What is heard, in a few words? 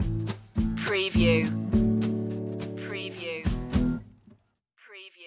country stinger